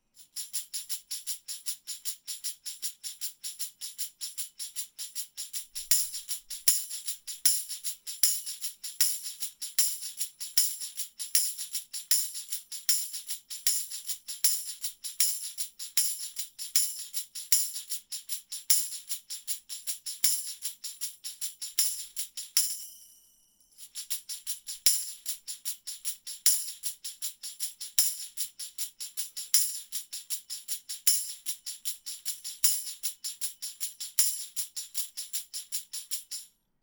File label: Tambourine